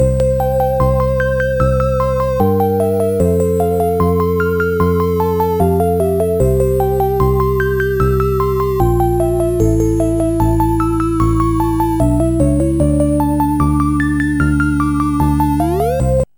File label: ding ding; ding ding 2; MusicLine